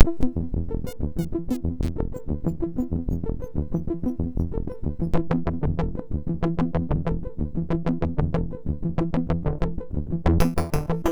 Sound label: Waves, sid MOS6581R2; Odkin